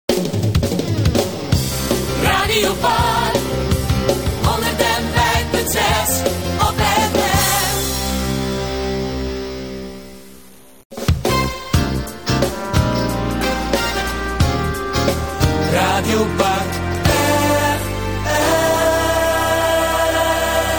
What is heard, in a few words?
jingles; Park Brasschaat